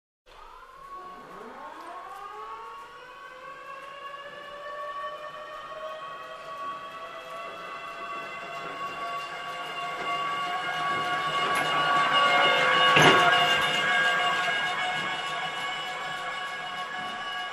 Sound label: Industrial chamber machine turned on